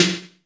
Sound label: WET S808, WET SNARES; Masterbits - Soniq Elements